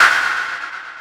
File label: step, sfx; anvil